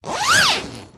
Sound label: zipper, tent; open close